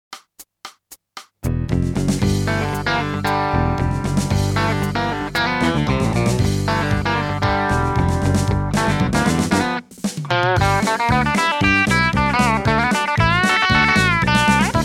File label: country